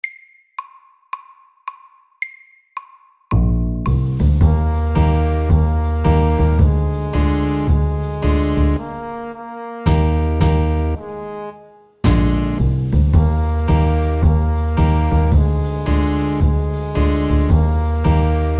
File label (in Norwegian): Godteri MEDIUM 105